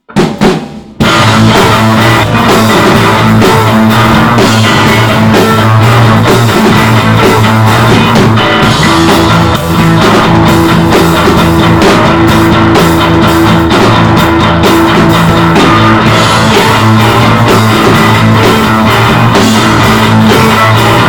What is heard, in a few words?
Main Riff